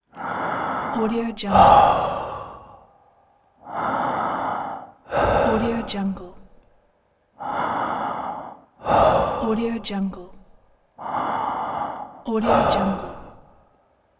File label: breath